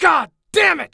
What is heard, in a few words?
axis win